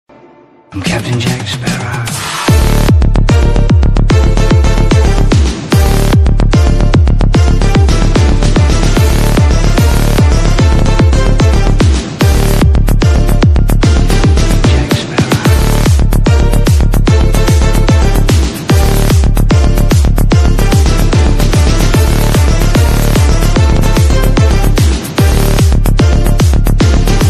trap trance ringtone

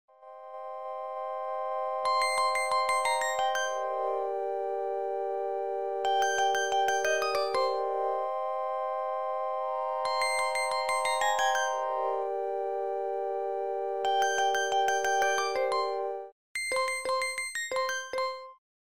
ambient mood ringtone

